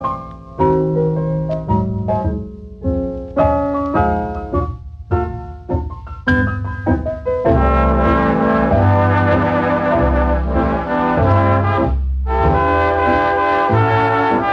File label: Trombone Choir